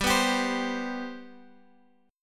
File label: G Major Add 11th, G; Gadd11 strummed; JGuitar